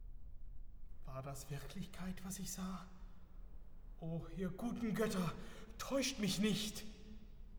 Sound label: opera, Rezitativ und Arie; (The Magic Flute), K. 620; Act 1. No. 4.; Mozart; Die Zauberflöte